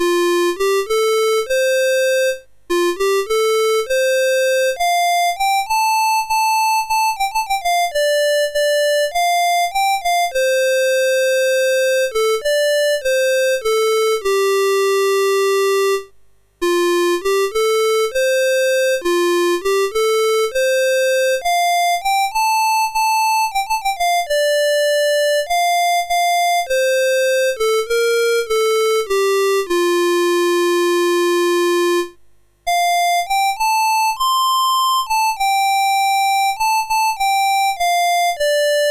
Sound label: Waltz, MOS6581R3; Suicidal Software